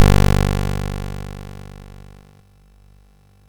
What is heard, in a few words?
Frantic, MOS6581R4